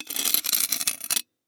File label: knife scrape; prosoundfx